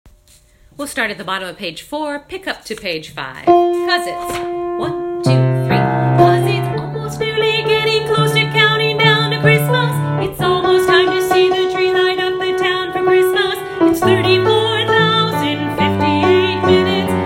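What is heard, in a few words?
Counting soprano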